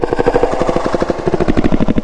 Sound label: growl low, antlion guard; hl2